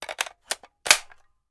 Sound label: Machine Gun Foley; Sound Ideas; Guns